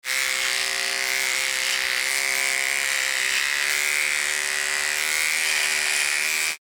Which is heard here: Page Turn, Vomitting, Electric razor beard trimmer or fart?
Electric razor beard trimmer